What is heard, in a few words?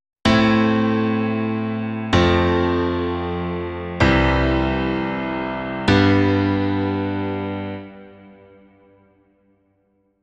piano